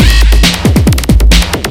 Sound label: beat; hyde